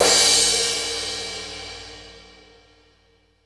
CY CR1, DrumBase5000, DYNA KITS; Crypton - DrumBase5000

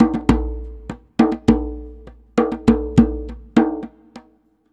Java Ket Jak, Ethnic Percussion; Sonic Foundry (Sony Creative Software); Ambient Atmospheres & Rhythms

